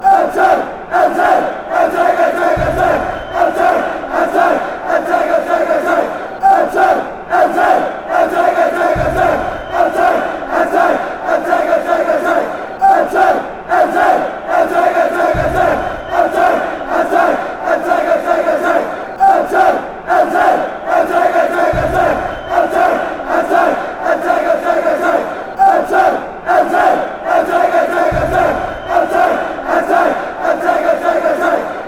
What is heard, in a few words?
attack attack attack, fanchants